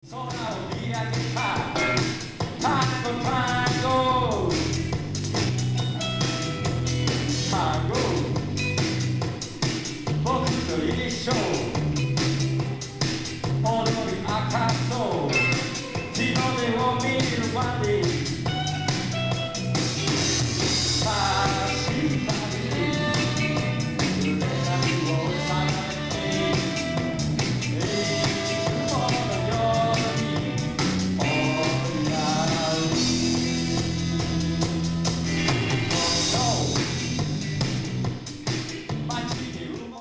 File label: tango